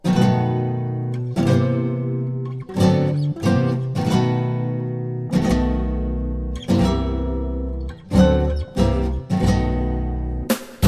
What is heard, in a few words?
Em Flamenco